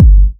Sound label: BD, korgdrums